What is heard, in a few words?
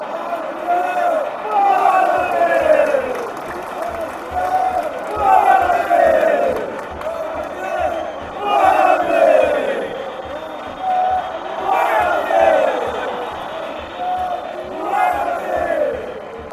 chant; metalist